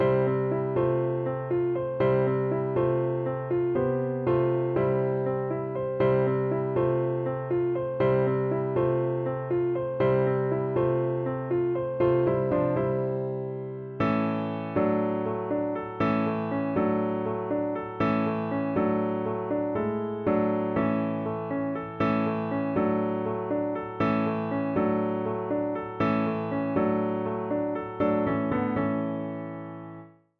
Plagal Cadence in 6th's Exercise

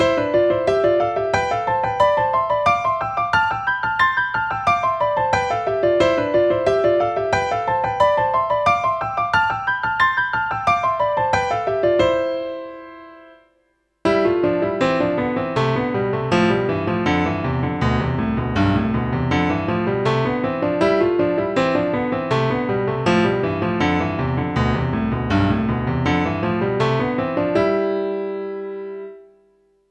Exercise in 6ths

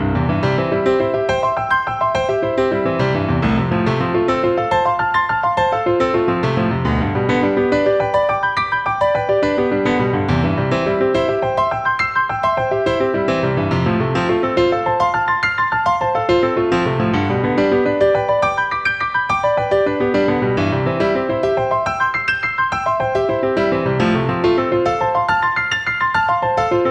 Exercise in Harmonized Arpeggios for 2 Hands; Technical Exercises for Improving Keyboard Technique

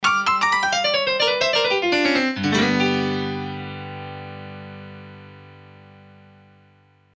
Piano Tag

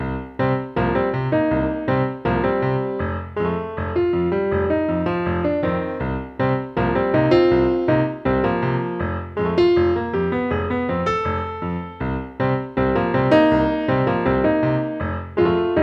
Exercise in 'Swamp Rock'; 4ths, 6ths & Tritones (grand piano); Technical Exercises for Improving Keyboard Technique

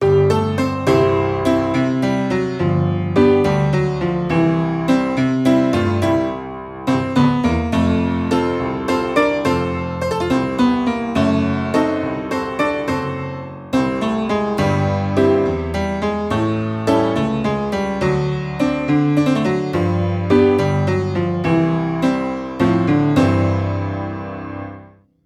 Exercise - Tack Piano

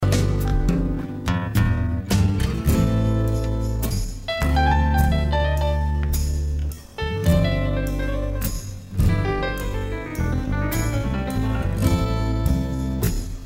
piano solo

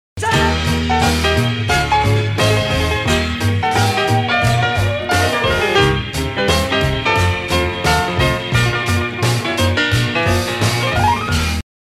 Piano Solo

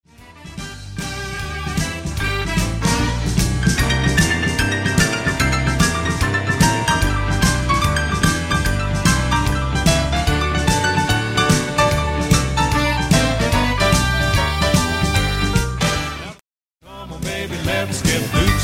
solo & lick

